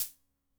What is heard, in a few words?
HH, Video-Tech Rythmic10